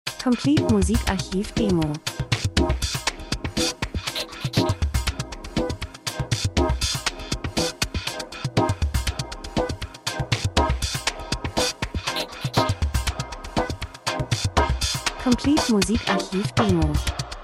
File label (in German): drums, rhythmus; Urban optimistisch Elektro Spannung Menschen Zeitgeschehen Dokumentation Schlagzeug Sequenz